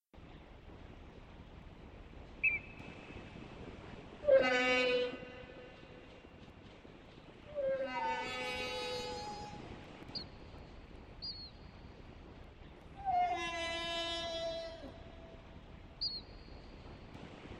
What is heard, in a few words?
goat bird song